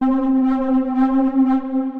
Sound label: Dirtypad, Synth Pad; Ableton; Factory Packs; Beat Tools